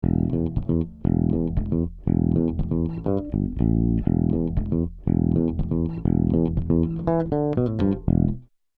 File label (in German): Summit Audio TLA 100A Bass Attack Medium Release Fast max. Reduktion 5 dB